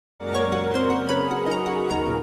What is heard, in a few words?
Error Stop; SpellCraft